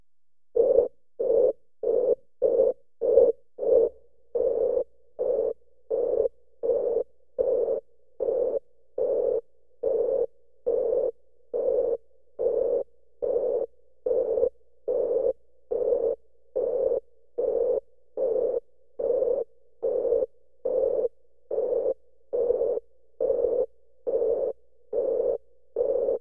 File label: Carver CD-4000 Noise Bursts; sonic holography disc